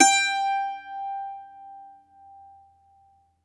MANDOLIN, Plucked Strings; E-MU Producer Series Vol. 7 – Old World Instruments

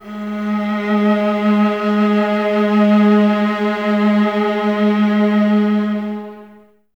violas G#3, String Ensembles; Miroslav Vitous; VLS 1+2

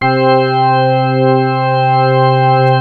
GRIT ORGAN, ENSONIQ TS-10; Rubber Chicken Software Sample Bank

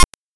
D70 DRUMS, Roland D-70

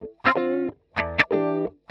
125 wah 1 chord D E, wahwah; PowerFX - Nu Jazz House

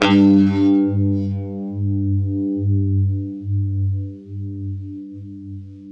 UNIVIB G, Guitar; East West; Steve Stevens Guitar Samples Collection

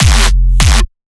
bass hit Malfunction F, Bass Stabs; Virtual Riot

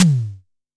Tom, Vel High, Tama TS-206

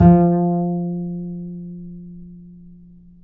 F 4, Bass; Spectrasonics; Bass Legends

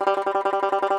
T. BANJO, Tenor Banjo, Plucked Strings; E-MU Producer Series Vol. 7 – Old World Instruments

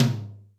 TOM L; XR-10; Crypton - DrumBase5000

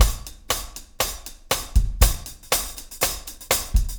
120 4 4 STrimbt; Big Fish Audio - DOM

